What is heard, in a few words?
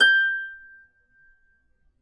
G5 HARP; Complete Harps; USB Soundscan vol.62; AKAI